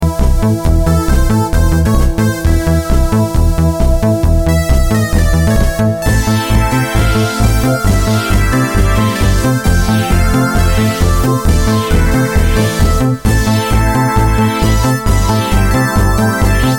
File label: Haunted Disco